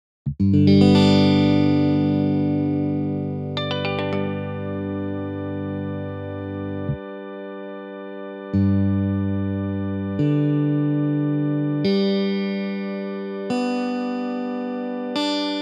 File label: Crowd; Samoa; crowdsourced tunings